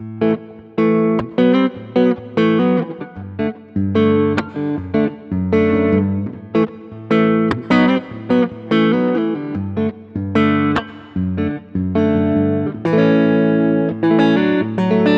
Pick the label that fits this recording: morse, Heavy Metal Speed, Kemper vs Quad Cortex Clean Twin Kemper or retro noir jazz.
Kemper vs Quad Cortex Clean Twin Kemper